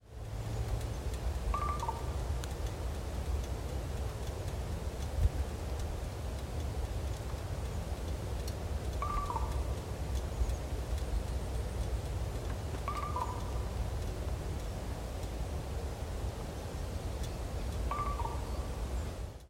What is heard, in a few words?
xylophone; iecava; g.rozners